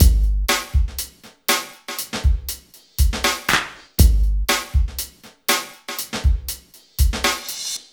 120, LOOPS, Dance Trax

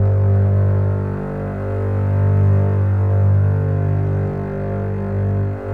Contrabasses G0

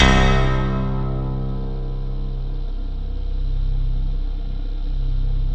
JV-1080 BELLS PNO; PIANO-EXP; Syntec; Wall of Sounds

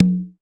Korg 01W Percussion

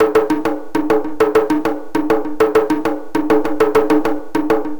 Inverse Djembe, Acoustic Beats; Sonic Foundry (Sony Creative Software); Myths of Technology

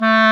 WND CLAR F, Clarinet; Roland L-CDX-03 Disk 1; Clarinets

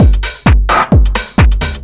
tick tock tom

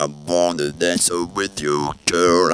DANCE WITH U, Funky Vocals; USB Soundscan vol.18; AKAI

